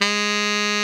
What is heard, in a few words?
TENOR MF G#3, Saxophones; Greytsounds; Philip Wolfe David Crozier's Saxophones